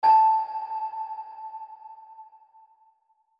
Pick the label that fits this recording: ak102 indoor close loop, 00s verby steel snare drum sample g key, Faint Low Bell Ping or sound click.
Faint Low Bell Ping